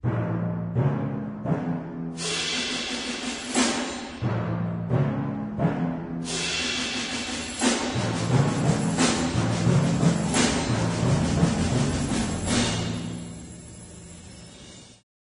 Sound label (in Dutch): slagwerk